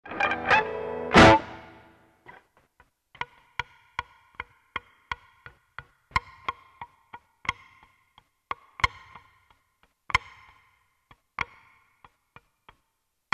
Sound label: plinkerings, guitar